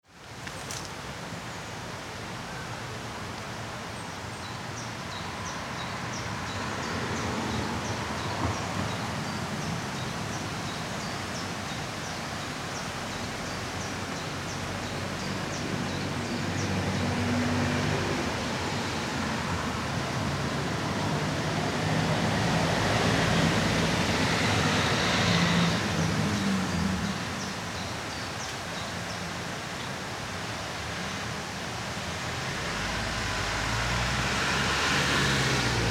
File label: Kingsdown Kent